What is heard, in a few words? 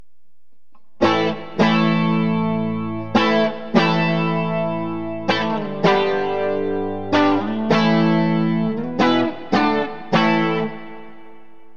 blackout bridge clean, burny fugly clips